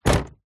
car door close volvo 850

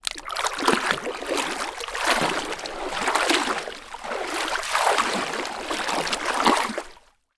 water movements gentle swimming pool; zapsplat

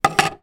household sunglasses plastic down on wood table; zapsplat

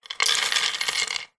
industrial chain small set down wood

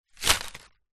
foley paper small thin pieces drop on wood; zapsplat

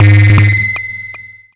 sound design low frequency resonate with shrill; zapsplat

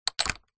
household gate wooden close metal latch; zapsplat

